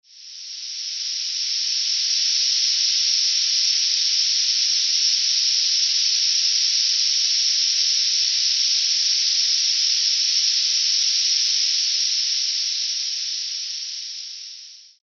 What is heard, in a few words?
5.7 kHz Noise Masking; Tinnitus; Tinnitus Soother V2